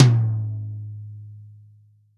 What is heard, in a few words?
TOM, Real Drum Kit, POP KIT; Best Service ProSamples vol.43